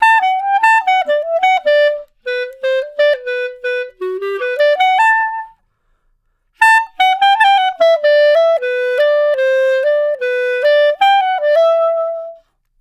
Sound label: Clarinet One Shot, melody; Jazz Solos and Sections; Traditional; Clarinet Melody One Shots